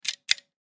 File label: Coin Dispenser; Coin Dispenser Dime; Money Sound Effects; Foley; Objects; Blastwave